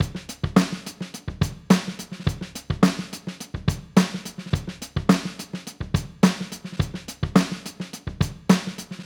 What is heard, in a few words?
Live Drum Break Wet